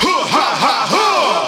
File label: hoo ha ha hoo; ooh ahh; ho hoo woo